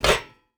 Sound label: footstep burlap metal jump land; Rust